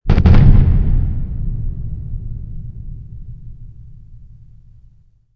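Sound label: explosion distant; amb elements; modern warfare 2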